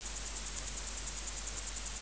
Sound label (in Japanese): sesujitsuyumushi; insects